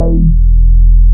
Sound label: BAS MINI, Mini-Moog; Sound & Vision - Gigapack II CD 1